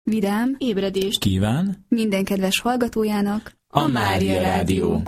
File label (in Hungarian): Reggeli Jingle; GYULAFEHERVAR